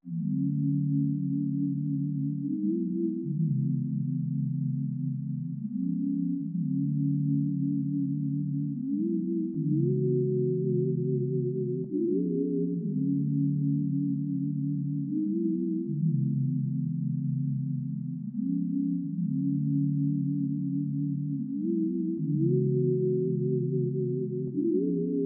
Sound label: 152 BPM D# Min Pad 2; Loop Stems; Cymatics; Make You Mine